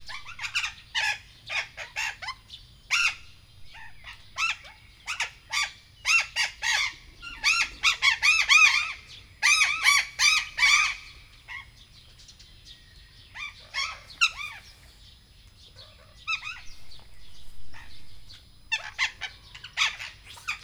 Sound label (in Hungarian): formába lendülve, sziklapapagáj; miskolci zoo 2018